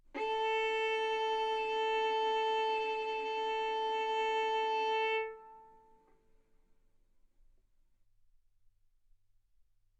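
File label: Violin arco mf A string A4 B4 stereo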